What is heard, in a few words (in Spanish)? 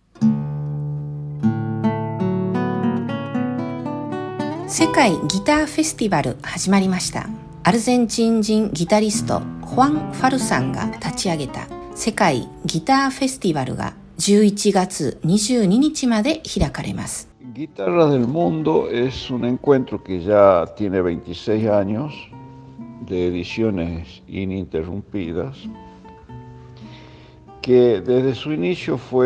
Guitarras; Japones